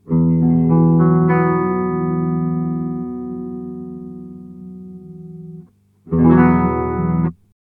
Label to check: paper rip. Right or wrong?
Wrong